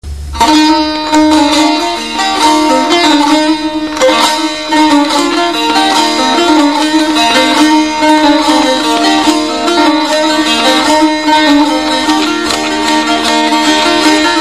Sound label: saz